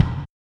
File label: RX8 BD, Yamaha RX-8